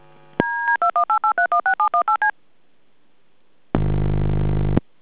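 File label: dtmf before ring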